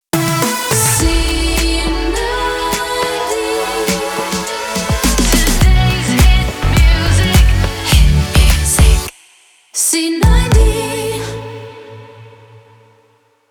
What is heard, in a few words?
Jingle 104bpm A Main ID